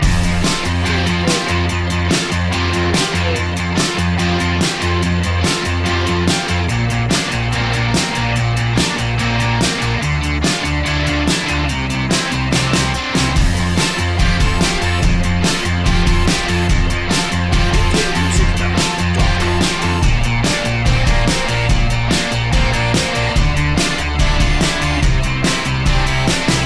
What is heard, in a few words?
kicks loop